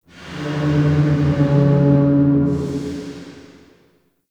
Distant Pipe Burst; Ambience; Warframe; Corpus Railjack

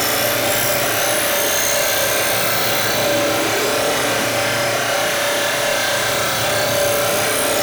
Energy Strike Loop; Warframe; RailJack; Ambience; Exterior; Venus Region

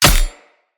Long Sword Impact Flesh

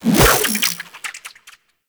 Fishing Spear Line Retract; Weapons; Venus Fishing Spears; Warframe

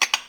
Mug Clink; Warframe; The New War; Zariman

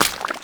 Jester Steps Water, Footsteps; Foley; Warframe; Grineer Royal Jester

